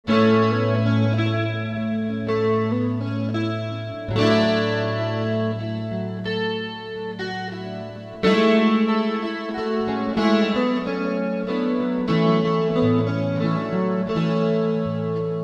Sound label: Loop